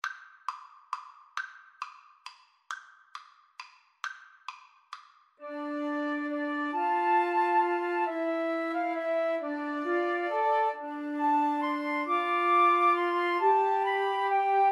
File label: Flute Trio